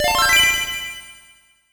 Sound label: Credit, UI; KOFForever